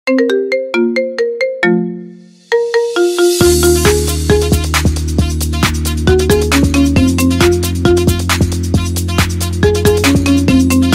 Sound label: Marimba